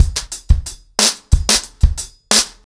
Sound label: 90BPM, acoustic drums, drumloops